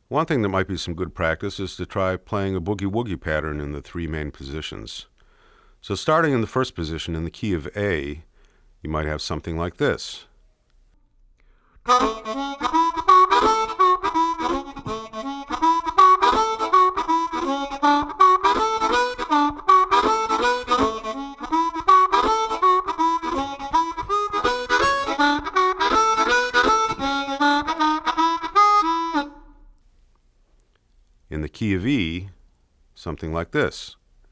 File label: Boogies 3 positions, harmonica; Blues Harmonica Masterclass